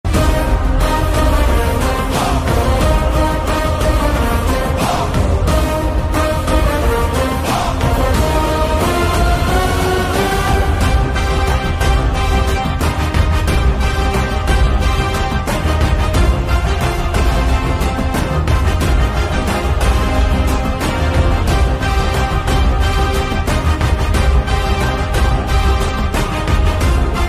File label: mass bgm ringtone; kanguva; naayaka